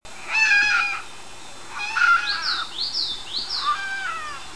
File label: fox kit cry